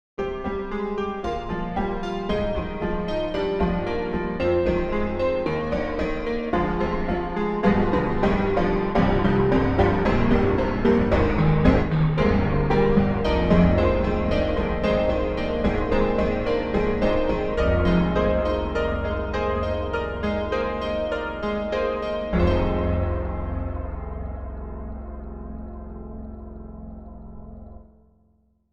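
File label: Piano Broken Piano Horror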